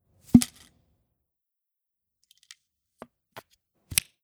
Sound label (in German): Treckingstoecke auseinanderziehen zusammenstecken, faltbare Treckingstoecke